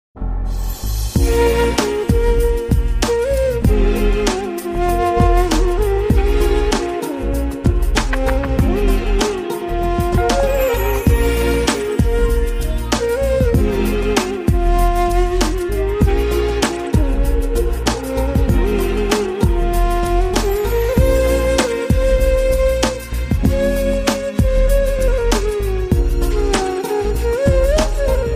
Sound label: saddest flute; ringtones